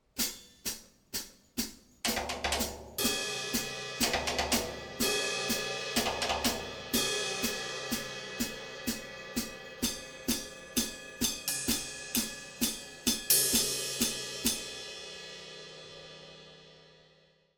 Pressure Cooker drums

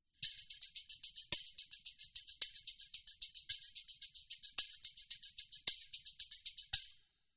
tambourine; The Beholder

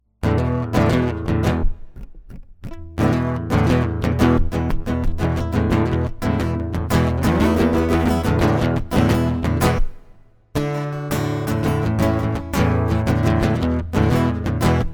acoustic

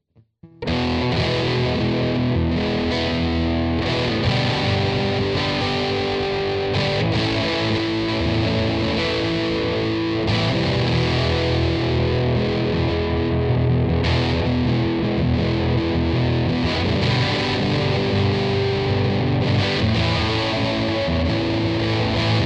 electric

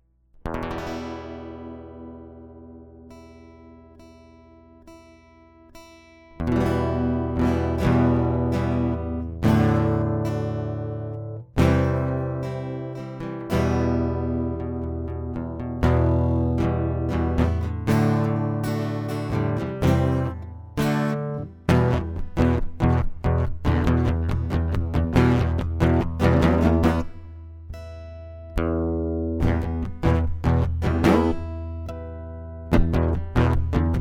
acoustic

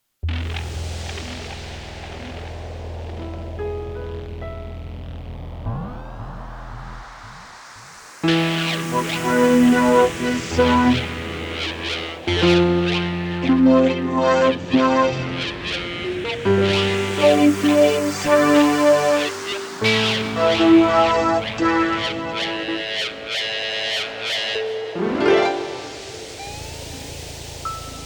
electric and acoustic

